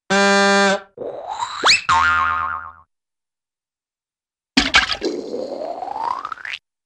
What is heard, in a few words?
Bulb Horn Honk Suction Jews Harp Water Plop, Cartoon; Hollywood Edge; Cartoon Trax